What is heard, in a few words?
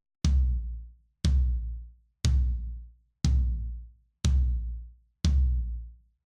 Floor Tom